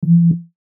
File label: Beep Low Ding Muffled; Production Element Imaging Element Accent Transition; Multimedia; Beeps